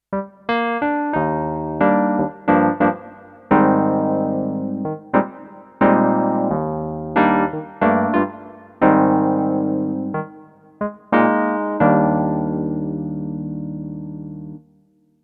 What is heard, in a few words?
Yamaha PSR-SX600 Vintage E-Piano